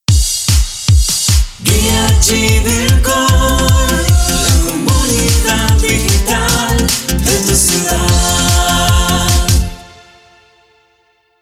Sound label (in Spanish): Jingle Cantado; Guia Chivilcoy